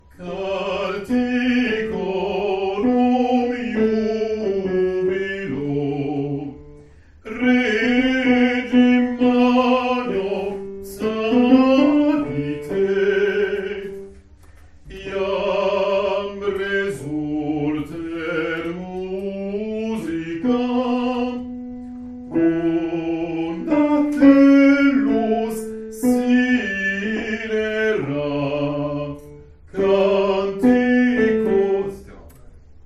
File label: Basses; CANTICORUM